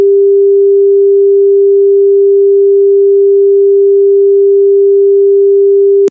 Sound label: phone tone